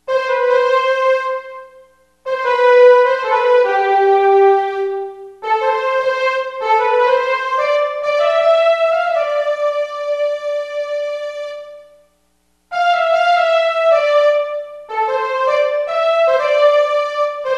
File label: pop melody horns strings